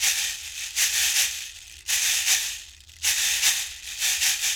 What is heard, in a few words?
Berimbau Shaker; World Percussion